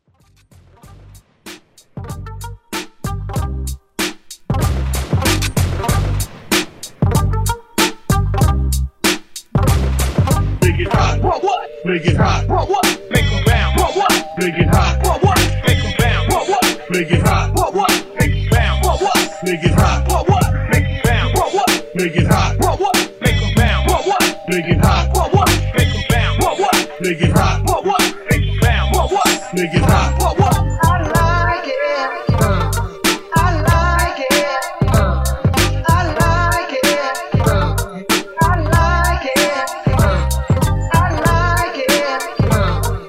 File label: Old School Ladies Party Break